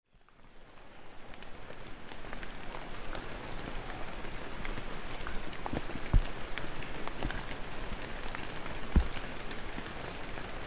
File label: Rain On Foliage With Close Big Drops; Rain Sound Effects